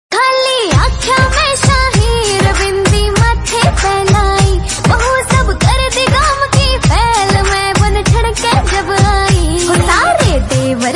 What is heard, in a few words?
Romantic Haryanvi Song Ringtone